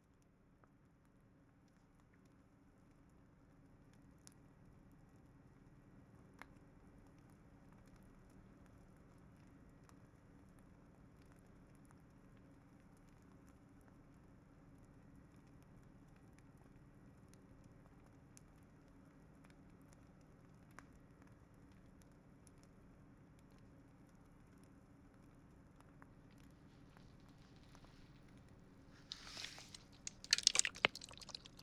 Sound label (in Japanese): 耳かき・左耳(ヘチマ水マッサージ、耳かき) ASMR; ちせのだいじだいじ耳かき